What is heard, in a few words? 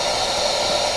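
Flame Idle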